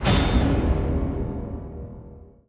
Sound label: Achievement Completed; Custom UI Sounds; Resident Evil 2 (2019)